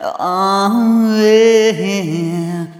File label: Arrhythmic F; Sonic Foundry (Sony Creative Software); ILONA